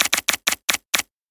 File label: risk roll card short; Fruit Fiesta; Wazdan